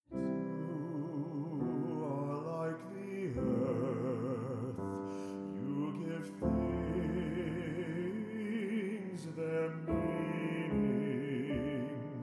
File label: Duet